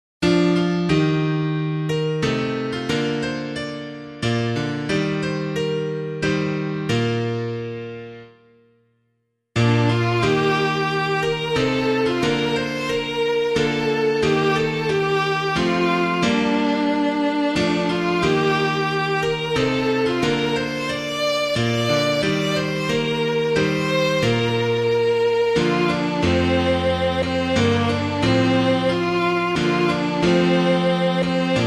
piano

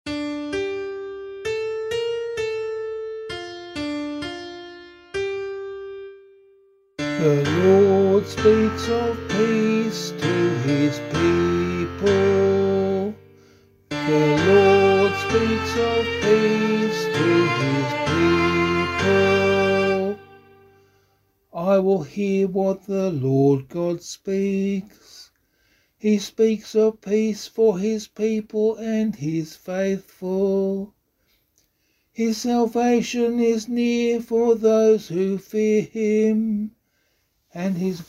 Psalm, vocal

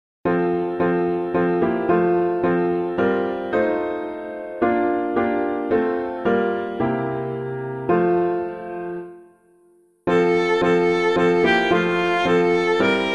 piano, hymn; Come Ye Faithful Raise the Strain; Neale; SAINT KEVIN

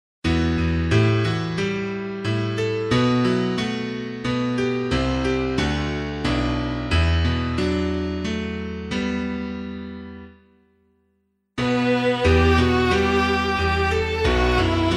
O God Who Gives Us Life and Breath, piano, hymn

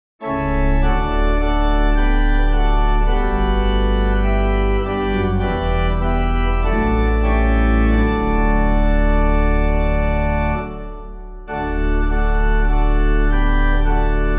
organ; Give Thanks for Christ's Apostles; Stanbrook Abbey; AURELIA; Clyde McLennan

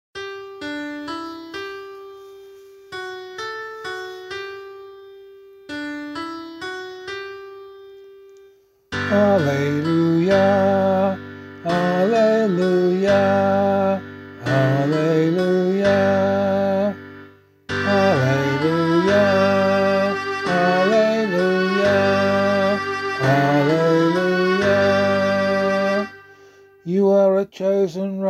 Gospel, vocal

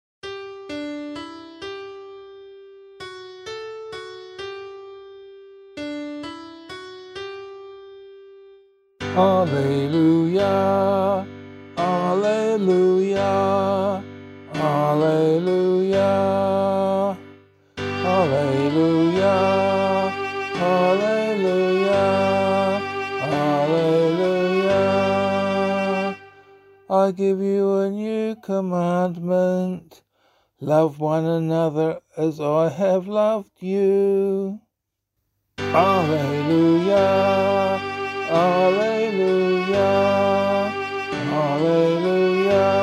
Gospel, vocal

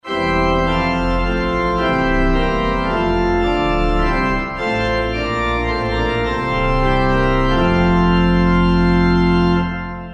organ

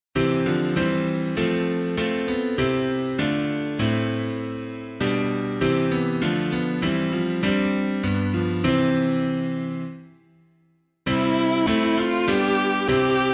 piano, hymn; Come Ye Faithful Raise the Strain; Neale; ACH WIE KURZ; Aisomen pantes laoi; John of Damascus

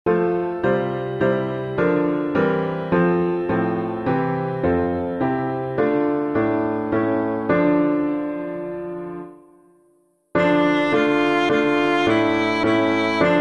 piano, Hymns

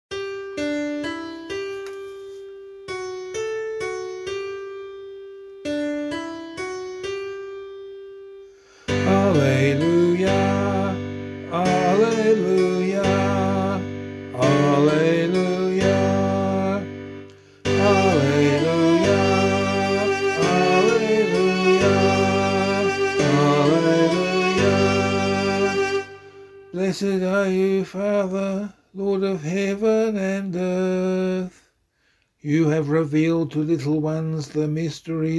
Gospel, vocal

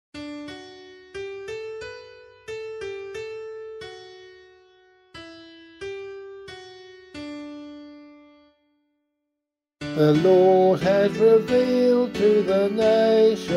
Psalm C vocal

